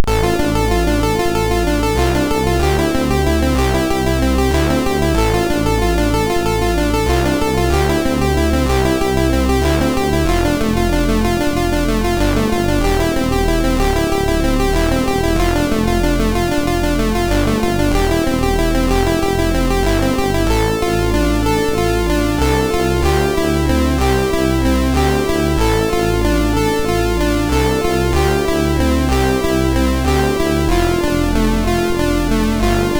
Soundscape Sample sid MOS6581R2

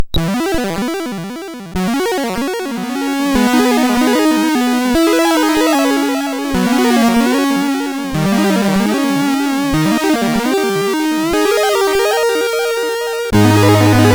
Game Over, CSG8580; sid

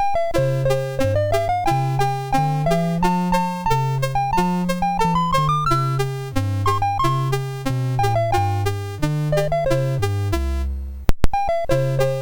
Dixie BASIC, MOS6581R3; sid

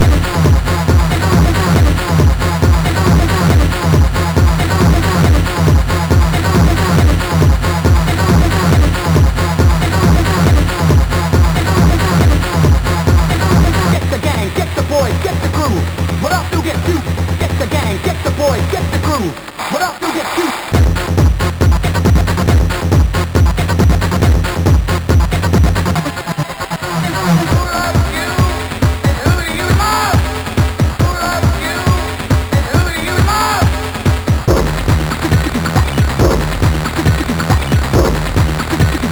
sid CSG8580R5

